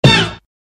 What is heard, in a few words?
boost; Jet Set Radio movement sfx